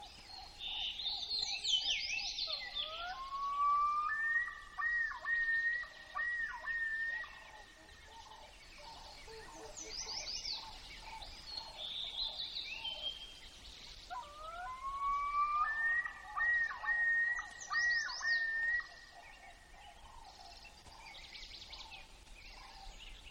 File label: Common Loon With Robust Chorus; alaska acoustic highlights